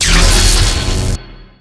Lightning Protect

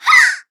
Attack Voice

